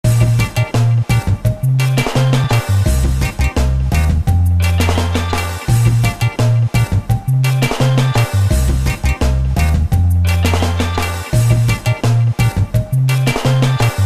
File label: Reggae Beat